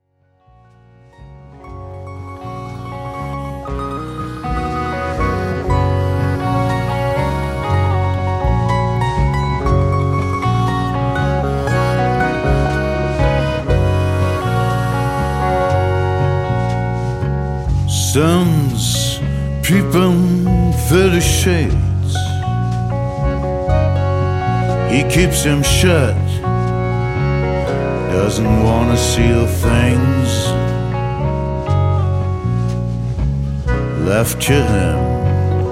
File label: roots rock